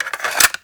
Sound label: Load Cassette